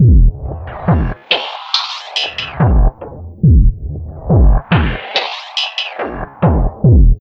FILTERS R, Dark Side Of TripHop; USB Soundscan vol.35; AKAI

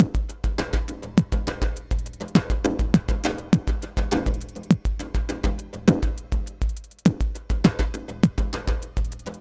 Terrestrial Hybrid, Processed Percussion

